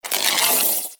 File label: Ball Held Spark; Warframe; Tenno Ball; Missions